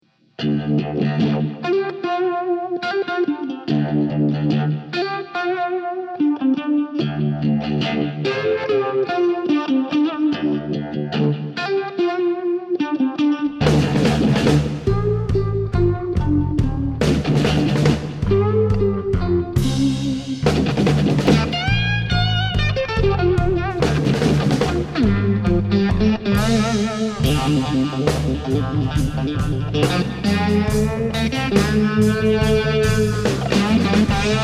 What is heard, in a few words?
axefx machinegun